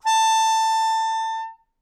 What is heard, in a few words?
Melodica Long, Long Notes Chromatic